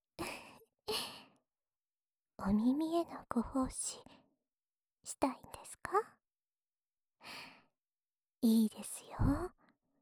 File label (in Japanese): 安眠用吐息ループ 左側